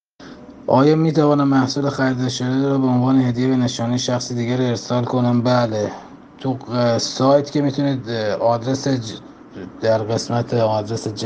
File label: audio traditional